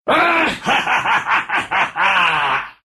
laugh, ru; Dota 2; Clinkz

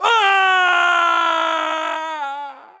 Falling; Engineer; Paladins

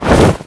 wing flap light; Dota 2

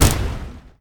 projectile impact; Snapfire

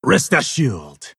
move pain; pangolin

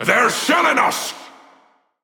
Taking Damage; Makoa; Paladins

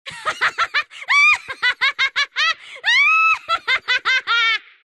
laugh; Dark Willow

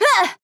Grunt Jump; Assassin

